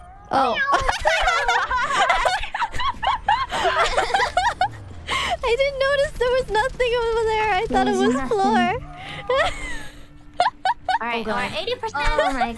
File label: laugh